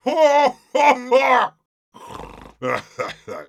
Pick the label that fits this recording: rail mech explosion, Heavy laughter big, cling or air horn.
Heavy laughter big